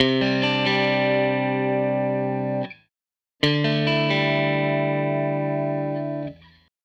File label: Strat Guitar Loop